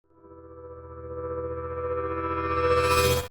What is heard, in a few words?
start; stormux